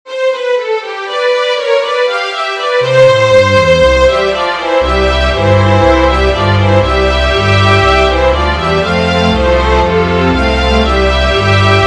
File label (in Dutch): viool